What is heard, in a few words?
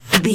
bullet flyby fast